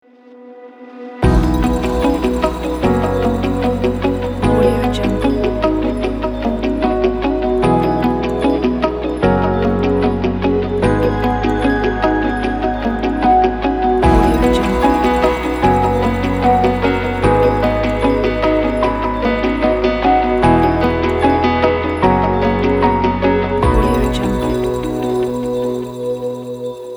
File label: ambient corporate ident